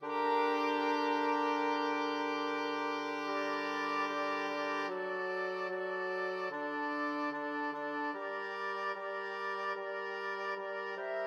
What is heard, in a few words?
flexible mixed ensemble, 4 players